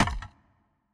Boat Man Wood

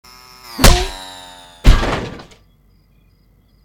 Timed jump; The Henry Stickmin Collection

